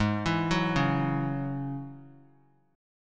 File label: Ab 7th Suspended 4th Sharp 5th Ab down; Ab7sus4#5 arpeggiated